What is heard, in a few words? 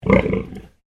Animal Pig Landrace Inside Grunt; Edgar; Edgar's Mission Farm Victoria; Pigs; Animals